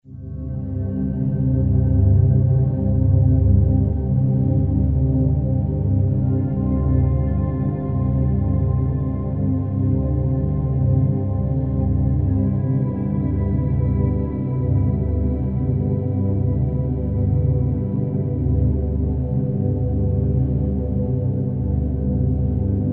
Amb Sound Scape Flowing chords, inspirational melodies, spare church bells and flute; Musical; Ambient SoundScapes